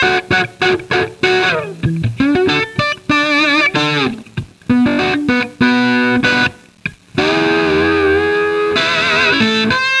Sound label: Chunky Riff; Sonic Foundry; Hip-Hop Street Beats Construction Kit; PaulBlack